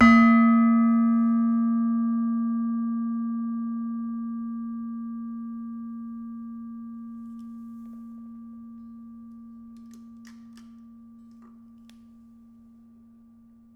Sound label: Demung hard, gamelan